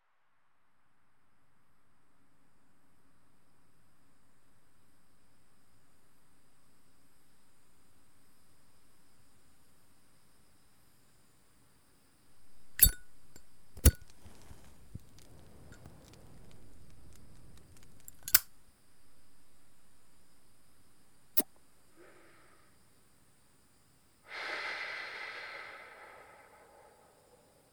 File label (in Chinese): 兩人在陽台上感受夜風